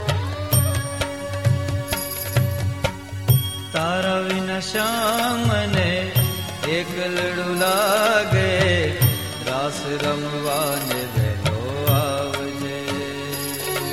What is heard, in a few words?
Garba Ringtone